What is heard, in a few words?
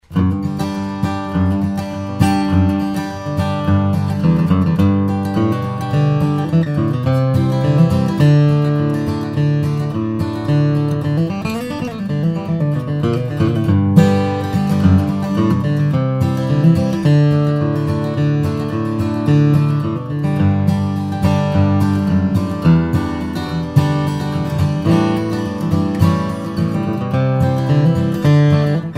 martin d45 v, Indian/Sitka, steel string guitars flattop